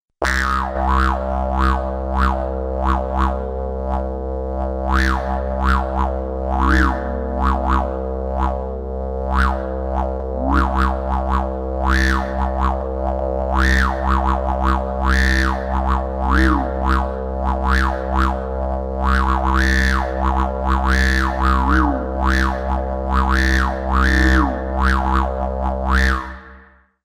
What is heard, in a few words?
Digeridoo; Cypher2; FXpansion